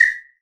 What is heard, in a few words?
SR WHISTLE, Drum Mashines, SR-16 DRUMS; EdgeSounds